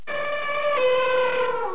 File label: elephant